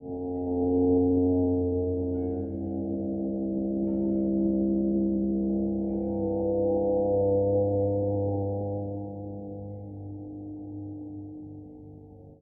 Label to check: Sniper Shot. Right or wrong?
Wrong